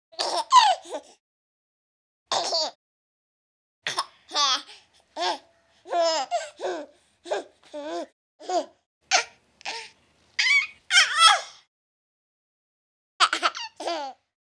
baby laughs various; Asian Show Sound Effects

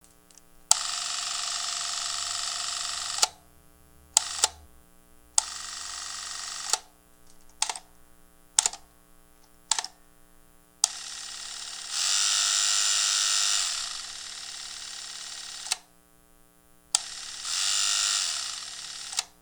Super8 Motor

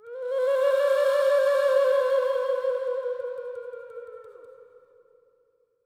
Echo Flute; Flutes

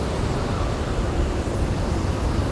howling wind

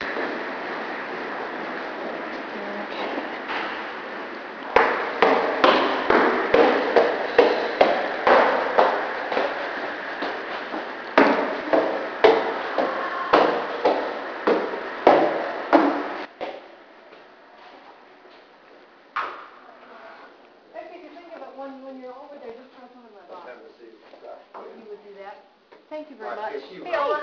steps

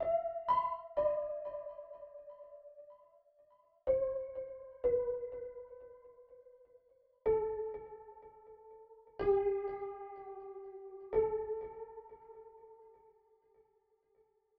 piano intro

